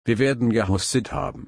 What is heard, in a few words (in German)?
husten